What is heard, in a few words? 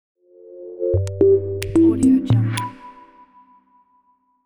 elegant and soft logo